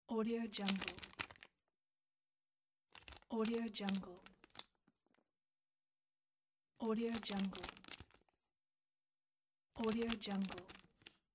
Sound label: medium zip lock plastic bag